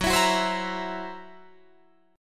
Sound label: Gb 7th Flat 5th Gb Guitar Drop D